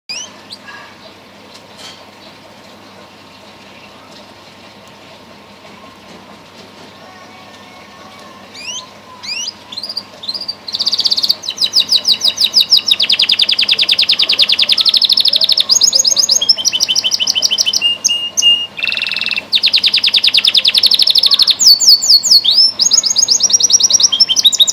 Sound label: Canary, Bird Songs